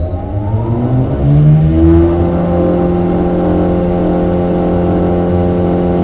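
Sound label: armoured personnel carrier first gear loop; hl2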